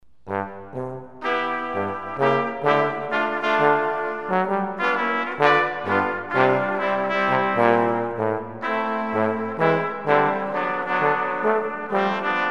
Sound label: brass quartet